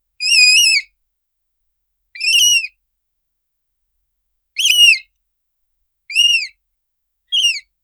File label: happy rat squeaking softl